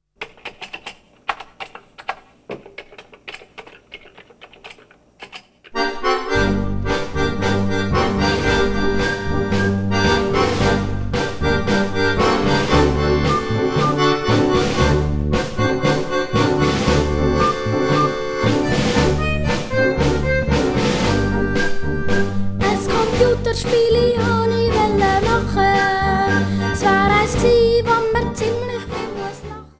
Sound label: computertango